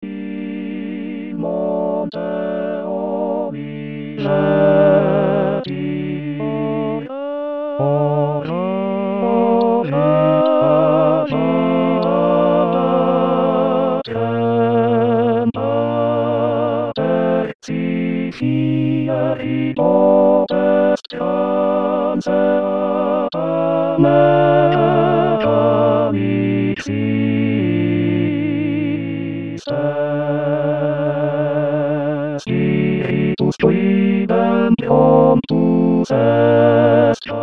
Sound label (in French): tutti; In Monte Oliveti